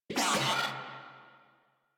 Moa Vocal Pain, Enemies; Warframe

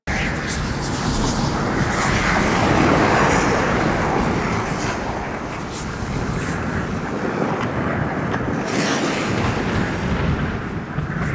Void Storm Loop; Warframe; Railjack

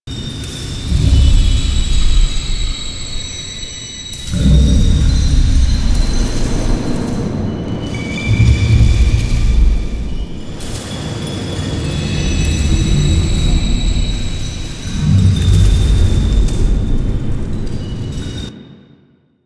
Interior Orokin Loop, Ambience; Warframe; Infested Eris

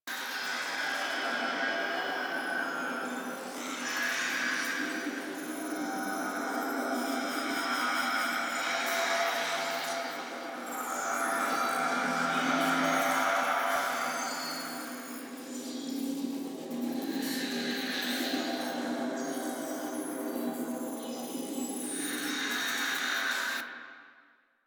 Rail Jack Large Room Lower Ambience; Warframe

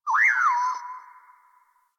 Night Zone Tree Critters; Ambience; One Offs; Warframe; Eidolon Plains

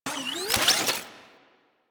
Drone Switch In; Warframe; Deimos; Cephalon Drone

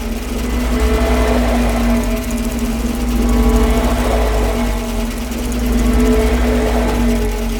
Moon Clock Machine Large Loop; Warframe; Ambience; Orokin

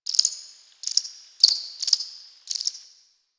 Cricket; Ambience; Props; Warframe; The New War; Zariman; Quests

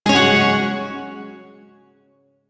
Shawzin Yo Chord; G; DE; Warframe; Duviri Shawzin

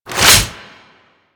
Flame Thrower Reload B; Sargas Ruk Flame Thrower

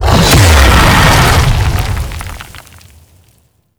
Tree Swing Low Woosh; Enemies; Abilities; Warframe; Teralyst

